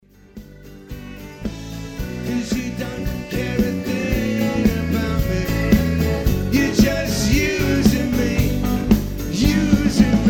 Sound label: Sax solo